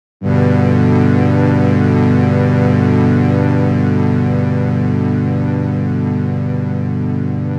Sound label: Pleasure Pad; jup8; Aphelion